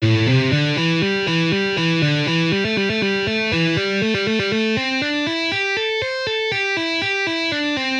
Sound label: Guitar solo